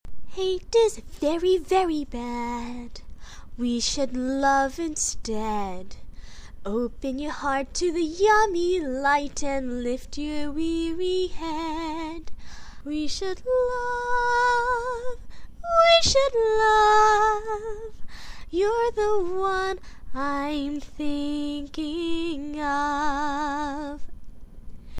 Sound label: happy healing song